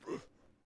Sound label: Inhale; Civilization4